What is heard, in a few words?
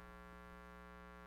BUZZ, Bass; Sampleheads; Will Lee Bass Library